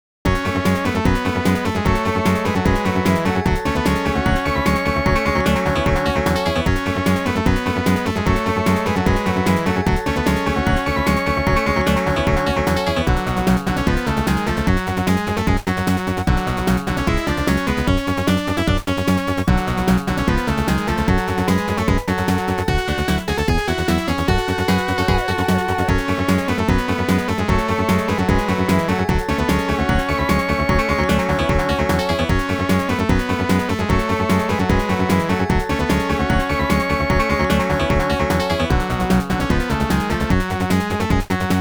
Get a Weapon; Mega Man The Wily Wars; The 16bit Audiophile Project; Genesis

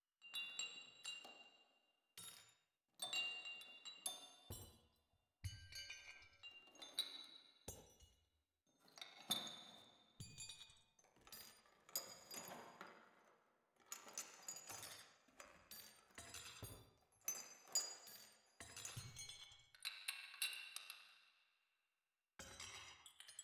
bells falling loop; Hollow Knight: Silksong

